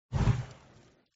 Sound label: hit fire; Thunder Fighter